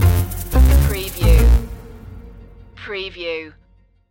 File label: retro video game kazoo world stinger